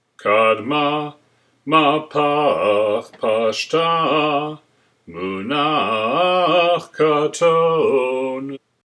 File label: Haftarah Katon, trope; sinai